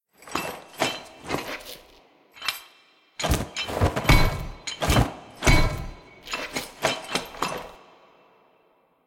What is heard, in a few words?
walk look out window phase